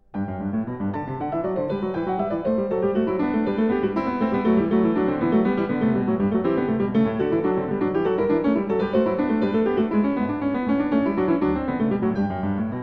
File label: Variatio 6 Cannone, alla Seconda, a 1 Clav., Goldberg Variations, The Piano; JS Bach